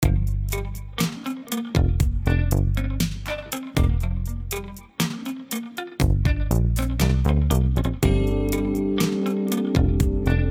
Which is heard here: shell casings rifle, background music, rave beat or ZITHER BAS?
background music